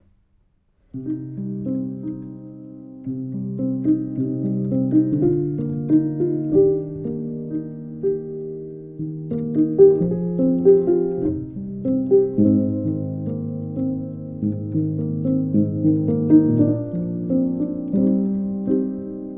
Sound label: ortf upright schoeps mk4